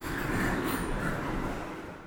door open, Train; OpenBVE

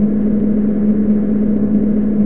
motor, Train; OpenBVE

